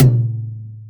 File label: greaser, toms; Real